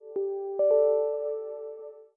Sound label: Windows Notify Messaging